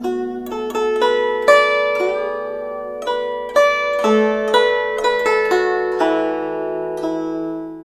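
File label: guzheng; Spectrasonics; Heart of Asia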